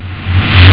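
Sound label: reverse snare drum; jazz jackrabbit 1; M8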